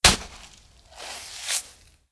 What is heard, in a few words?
Shovel Sand; blue archive